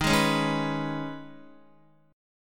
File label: D sharp 7th Suspended 4th Sharp 5th D sharp, Guitar; strummed; x,6,8,6,8,7; 4F,4A,5C,5G,5C,6E; D#7sus4#5; JGuitar